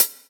dry hat g key, Royality free hi hat tuned to the G note. Loudest frequency: 10623Hz; drum single hits one shots; hat samples